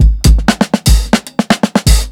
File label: 120 FILL, DRUMS LOOPS; BASEMENT DRUMS VOL 2